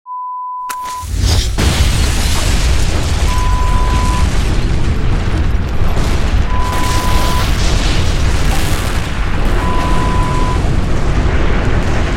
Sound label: Match Strike Building Explosion